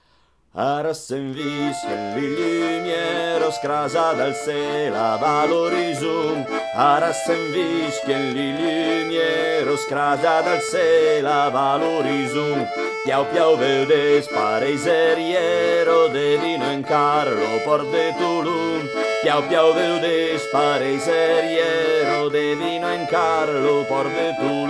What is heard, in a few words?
joan peirol contralti